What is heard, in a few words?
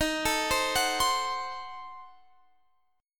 Eb Diminished 7th Eb, Guitar Standard; Ebdim7 arpeggiated; x,18,19,17,19,20; JGuitar